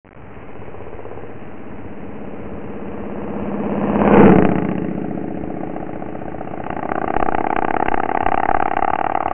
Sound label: passage, harley chopper